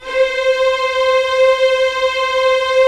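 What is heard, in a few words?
Strings Violins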